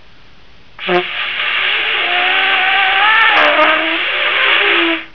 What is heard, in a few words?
trumpet